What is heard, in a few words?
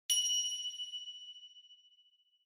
Finger Cymbals (Long)